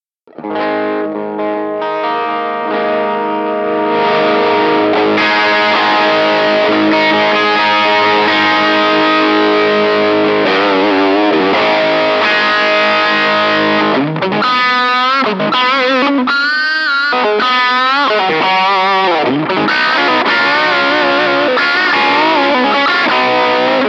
britannia direct, Gain 17, Treble 7, Bass 7, Brilliance 7; setup: guitar - Britannia - Behringer 2x10 - mic - mixer - sound card